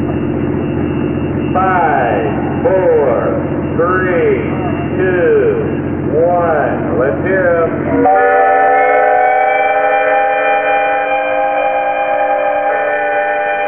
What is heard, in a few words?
whistles